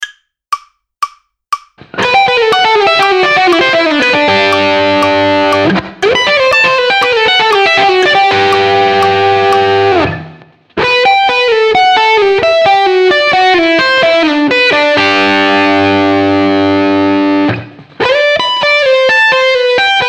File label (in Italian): Hybrid Corde Adiacenti